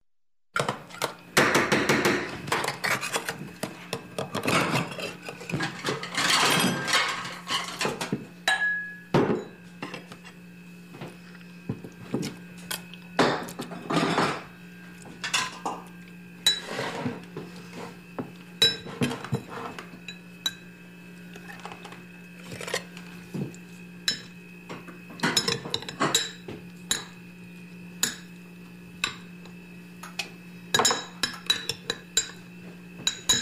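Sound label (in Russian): звуки посуды на кухне звон тарелок мойка в раковине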